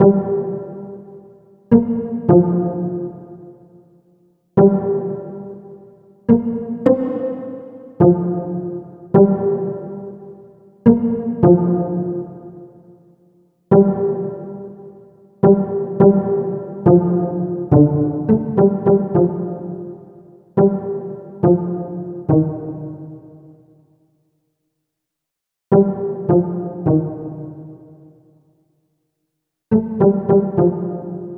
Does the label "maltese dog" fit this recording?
No